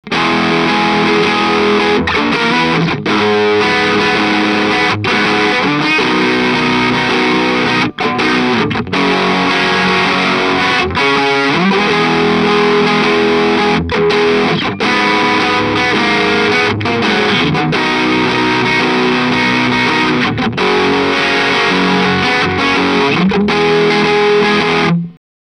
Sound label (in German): Boss DS 1w Custom Tone 2 Uhr Dist max